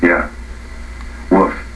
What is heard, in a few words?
Woof